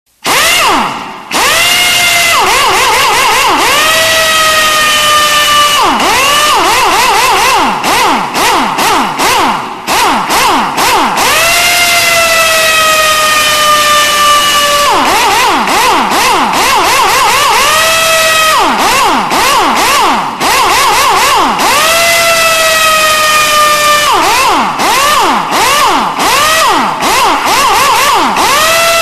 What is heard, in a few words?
Air wrench